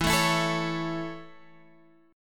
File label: C sharp Minor Sharp 5th, guitar chord